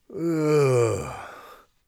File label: tired, Warrior Voice; Warrior Voice Pack